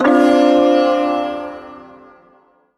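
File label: Shawzin Minor Chord; Warframe; Duviri Ersatz Shawzin; Chords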